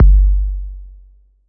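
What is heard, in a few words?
crunchy kit long kick; STYLE KITS (Hip Hop, Etc)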